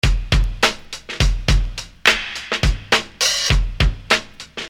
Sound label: Beat, Hip-Hop Street Beats